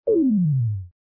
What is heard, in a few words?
Pad Shrink; smashing